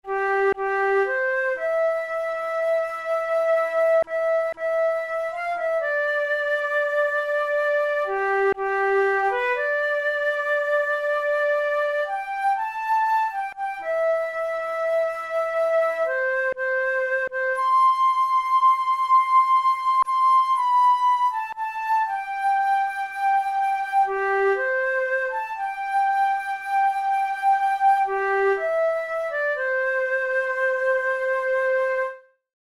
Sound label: drums, flute; dumbartons